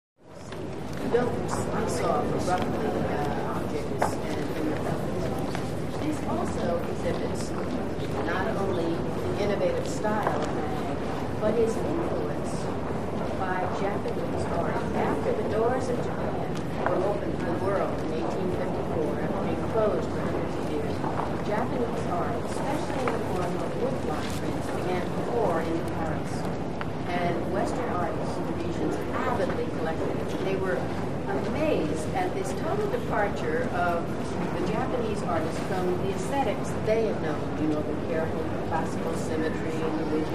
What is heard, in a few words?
Museum Interior Medi, Museum Interior, Medium Perspective Art Tour Lect